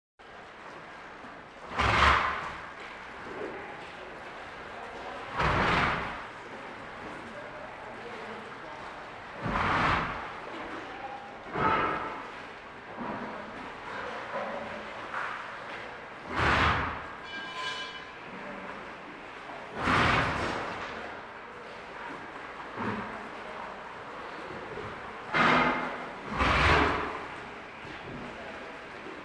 Animal effects snorts grunts farm ambience

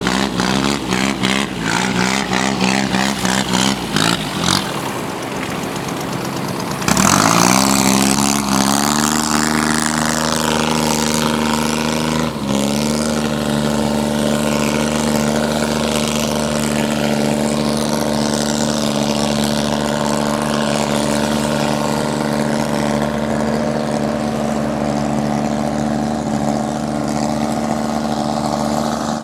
boat start away; best service Blue Box; sea traffic

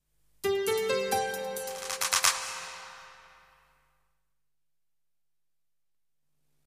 jingles; 90 DDD Jingles

